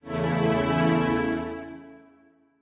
Strings end

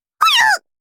fail sound

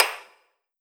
soft hit normal; osu

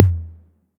TOM

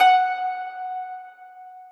harp

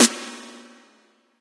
snare

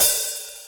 Hat Open; osu

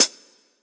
hihat; osu

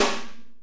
SNARE ugh

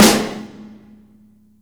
normal hit clap

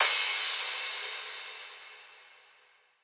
soft hit finish

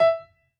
piano hi

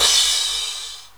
crash

